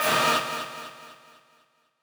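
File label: b stab1 a, note D sharp octave 4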